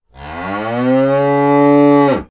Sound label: cow moo